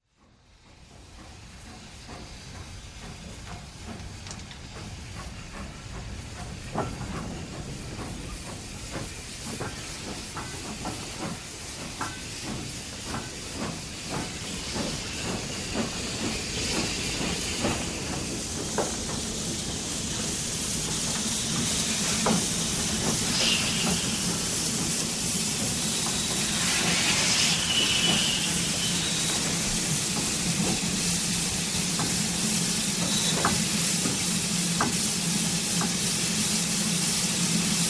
Train In-Stop Steam Train Engine Approaches Slowly; Hollywood Edge 3-D SFx